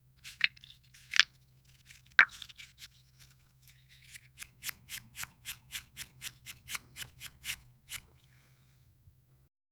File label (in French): gloussement d huile